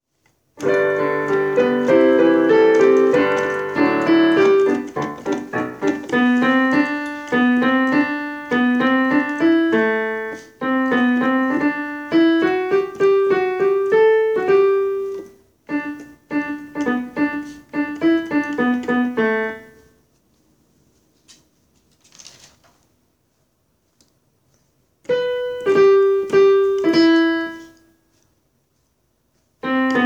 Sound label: Alto; Nelly Bly